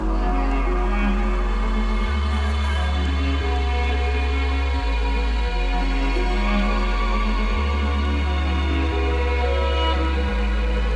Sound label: BPM 175